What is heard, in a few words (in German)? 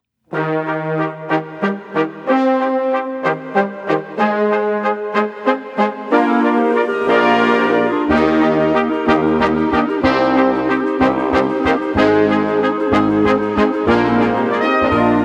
Walzer